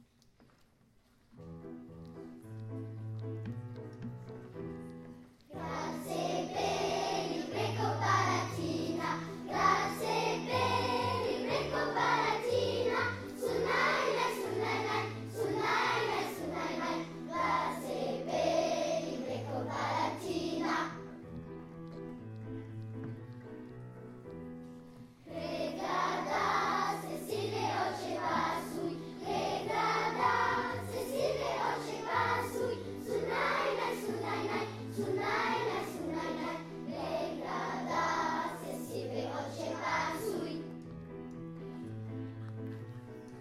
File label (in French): Chant traditionnel croate; Grad se beli; Lailly en val; Cycle 3